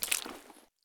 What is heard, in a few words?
prone puddle; Escape from Tarkov